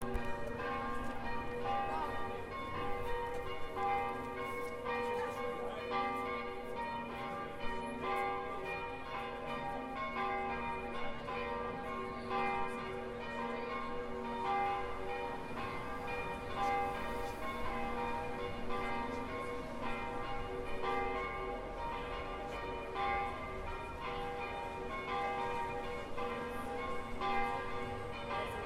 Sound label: Munich Bells; Field Recordings